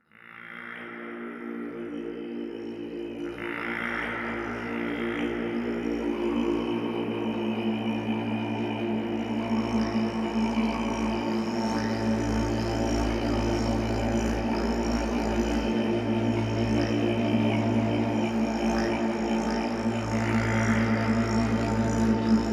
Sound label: 111 Hz Frequency